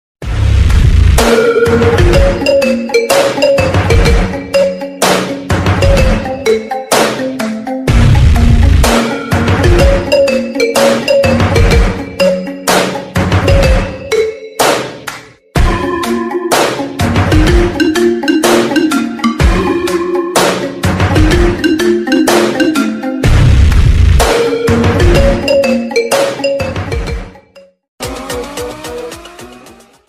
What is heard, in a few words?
best love ringtone, telugu love bgm ringtones